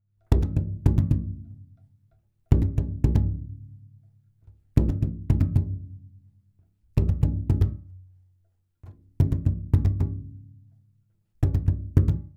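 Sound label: Big Drum sheepskin mallet; War Cry (Cayovinto)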